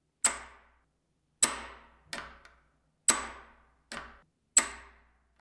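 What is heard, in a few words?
clock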